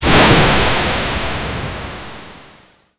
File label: ocean